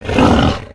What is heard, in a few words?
boar attack